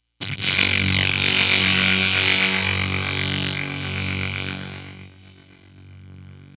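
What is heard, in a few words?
Electric Box Waves Arcing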